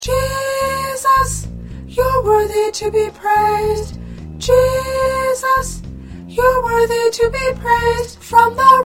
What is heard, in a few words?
Soprano, choir